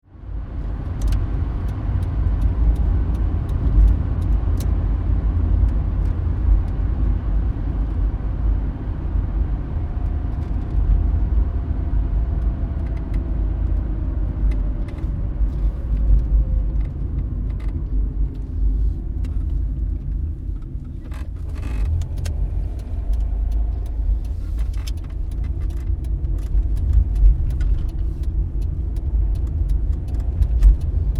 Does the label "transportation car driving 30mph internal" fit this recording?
Yes